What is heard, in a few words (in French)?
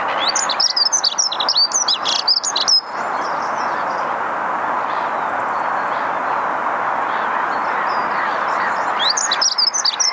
accenteurs mouchets